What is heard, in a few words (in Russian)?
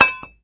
metallicheskij zvon granati